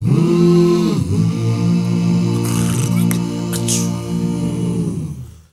M HUMMR A, MMM CHRDS; Spectrasonics; Heart of Africa 2

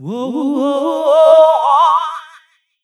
OHOUO VOX, Funky Vocals, ADLIB MEN; USB Soundscan vol.18; AKAI

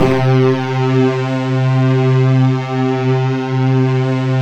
SQ1, Synthesizers

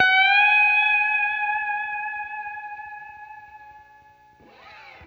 Guitar & Bass; Quantum Leap

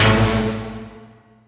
technosyn2, Techno Trance; Ueberschall; SYNTH

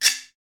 Guiro Wood; Club-50 - Foundations Roland

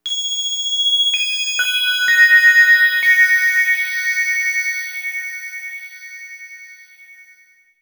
Bell

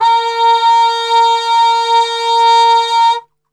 A#2, Brass, TRP CUP MUTE; Best Service; Brass Super Section; 90 s Sample CDs

